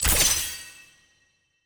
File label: UI Click; Irelia; bloom minigame